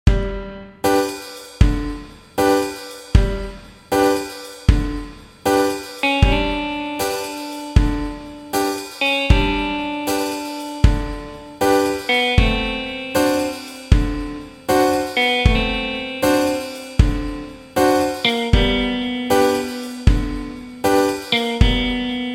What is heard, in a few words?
Syrtaki, ukulele